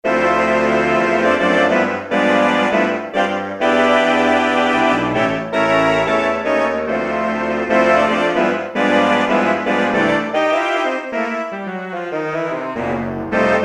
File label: SAX ENS